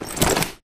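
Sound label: grunt movement foley loud medium; Titanfall 2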